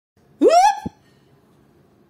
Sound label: woooop